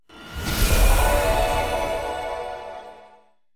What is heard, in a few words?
random Symbol Transform; fairyhansel